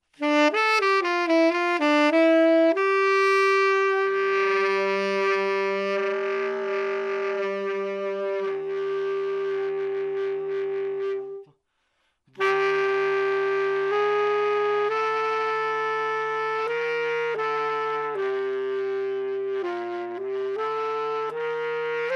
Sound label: Vox Tenor